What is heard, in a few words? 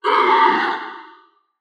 dinosaur grunt; SoundsCrate